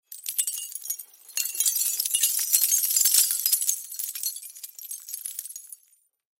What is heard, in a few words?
Crash Glass Debris Impact; Crash Glass Debris Fall On Concrete; Debris (Sound Effects:Materials); Blastwave